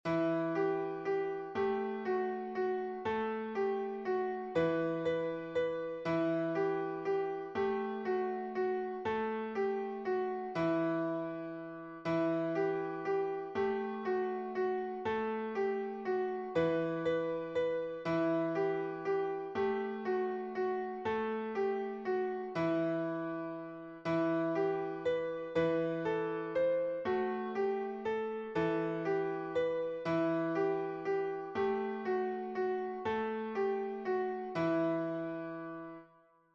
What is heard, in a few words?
Melody, piano